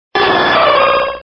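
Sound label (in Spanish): "Grito de Ho-Oh", Pokémon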